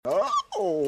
wing crack

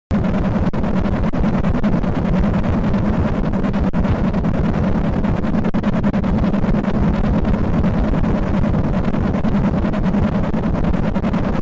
nightmare earrape

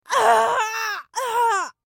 hurt sound hard version; meg thomas

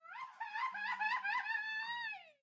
mexican grito

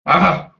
uhhu

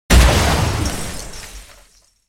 christmas ultimatum shoot; pixel gun 3d

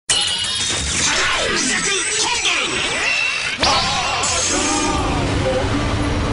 combo henshin sound; kamen rider ooo tajadol

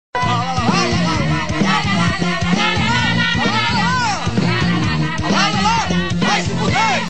lalala

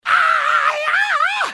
ayaaa

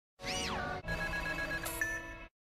life point sound effect

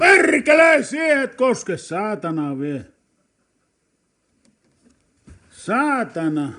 guy screaming perkele

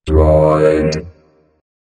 droid sound; motorola droid

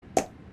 pop

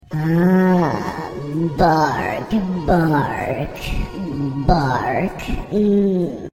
bark bark

